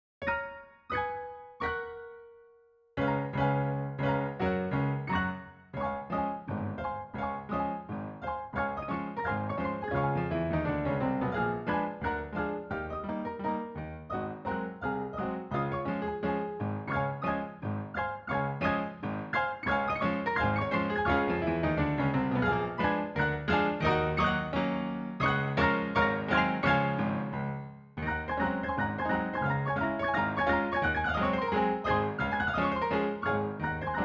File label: Fox Trot; Las Campanas; Digitalizzazioni Eseguite da AMMI LAB; Università di Pavia